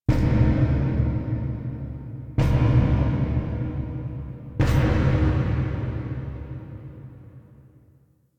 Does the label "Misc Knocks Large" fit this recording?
Yes